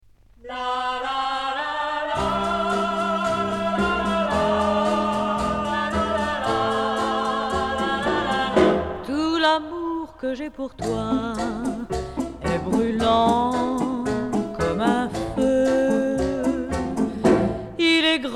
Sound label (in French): orchestre